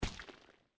chunk fall, gore; Soldier Of Fortune II Double Helix; XBOX

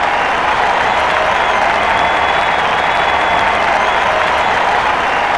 crowd cheer; Totaled; XBOX; crowds

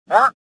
confuse; worker1

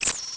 apply changes, menus; Soldier Of Fortune II Double Helix; XBOX

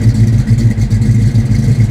Mustang 67 Idle, Engine; Cars; XBOX

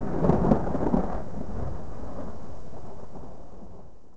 distant Thunder; Star Wars Obi Wan; XBOX

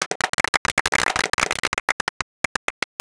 clap, Crowd, Spanish

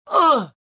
gasp; Star Wars Jedi Knight II Jedi Outcast; XBOX; bespincop

